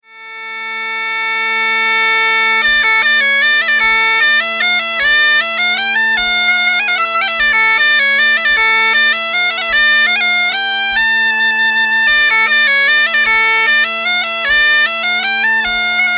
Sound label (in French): Menuet